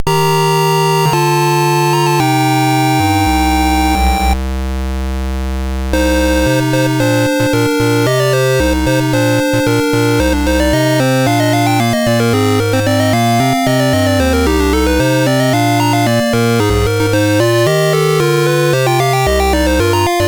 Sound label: Dragonworld sid MOS6581R4